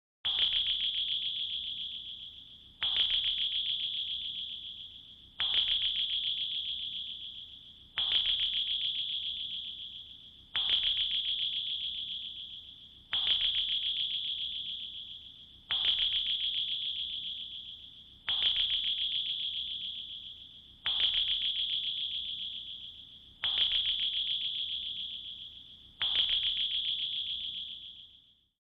Submarine sonar

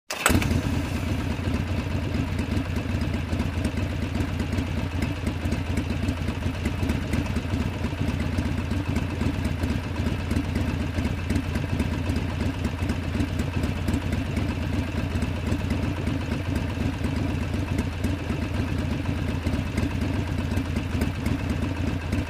Motorcycle starts, waits, turns off

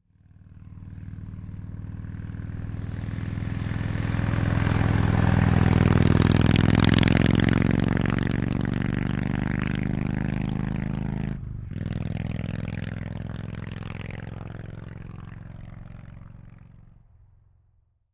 Motorcycle passes by

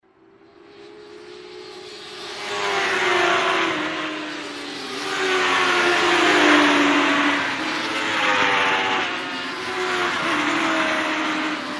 Ring motorcycles, finish line; motorcycle racing

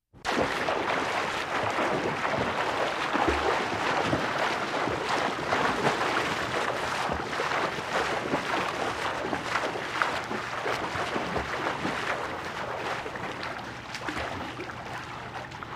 Man swims

